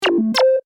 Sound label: Beep Button Chime, Scifi, Beep, Button, Chime, Science, Fiction; Tech; BlastWave